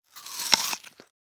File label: Apple single bite fruit crunching eating